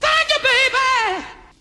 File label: "thank you baby" SUNG; expletives